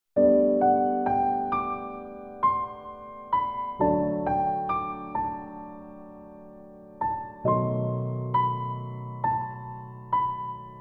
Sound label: Piano